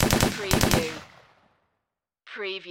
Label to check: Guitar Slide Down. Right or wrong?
Wrong